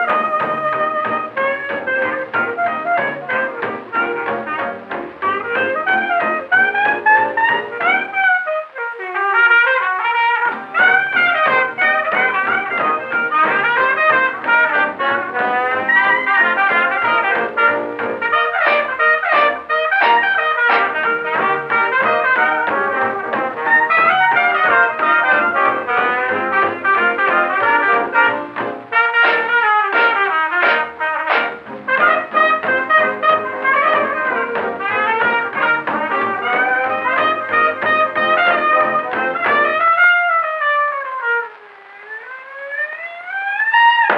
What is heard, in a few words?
music; Mafie 1